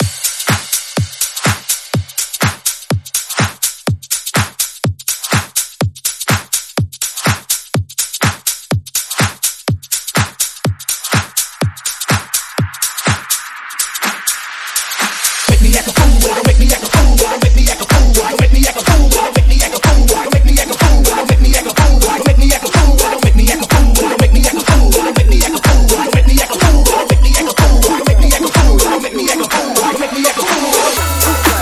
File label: House